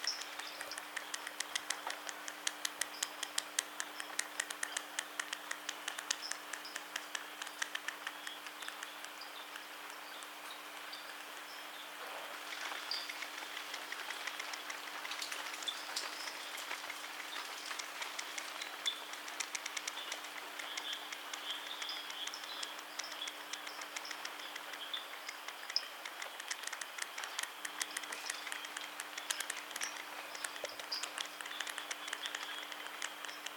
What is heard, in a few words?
Oilbird